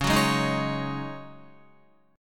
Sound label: C sharp Suspended 2nd Suspended 4th, Guitar; strummed; 8,9,8,8,8,9; 4F,4A,5C,5G,5C,6E